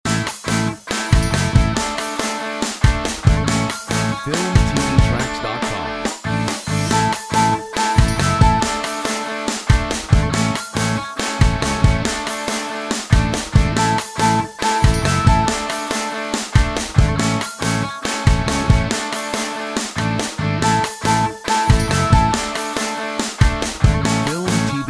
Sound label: Rocket launch